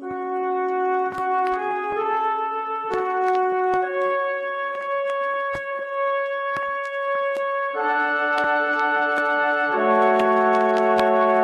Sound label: strings building, sad; Loops